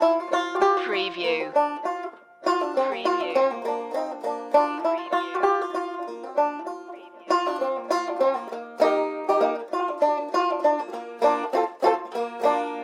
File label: barnwood banjo loop